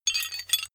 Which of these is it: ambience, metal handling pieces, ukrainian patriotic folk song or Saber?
metal handling pieces